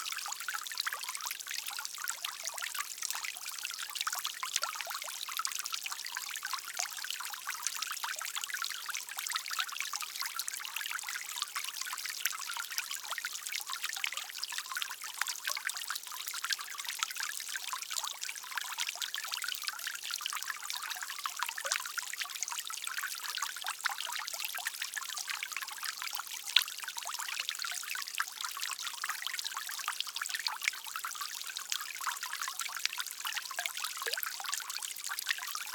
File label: FOUNTAIN Small Gentle Puddle Squirts Dripping in Backyard Close